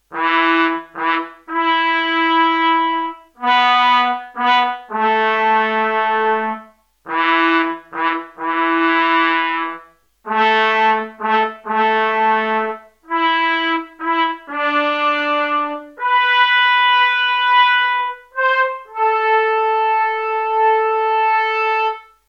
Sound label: Trumpets with BC; result obtained by using the BC along with a basic sample programming; Creamware STS3000; bank is used "as is", without any additional programming in the sampler